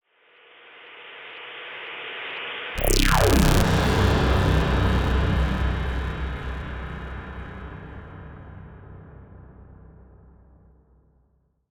kosmicheskaya udarnaya volna inoplanetnie ataki viravnivayushie effekti

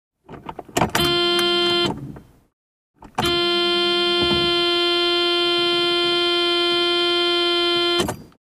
povorachivaet klyuch zazhiganiya signalit klakson avtomobilya

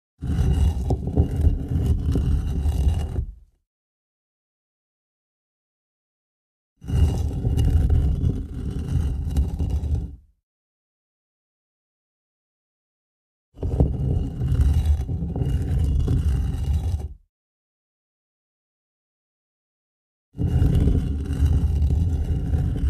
kamennaya plita

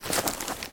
neakkuratnij shelest starih knig

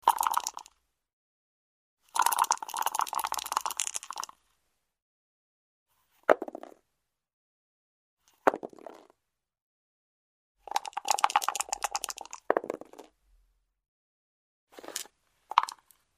tryasut i brosayut igralnie kosti

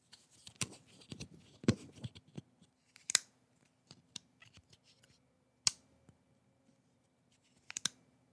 neskolko shelchkov v bistroj posledovatelnosti

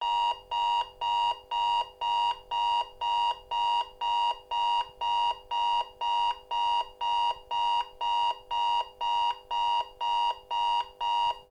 alarm klassicheskij signal elektronnih chasov s budilnikom

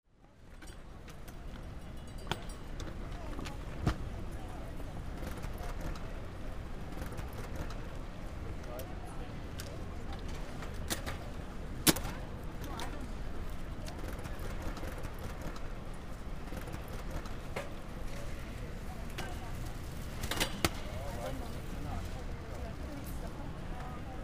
rentgenovskij kontrol

